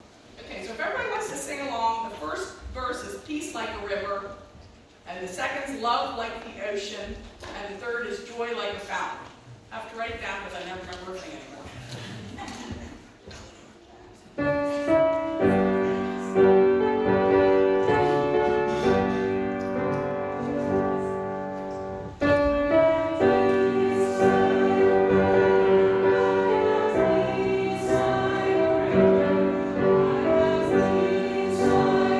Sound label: Children's Song; Peace Like A River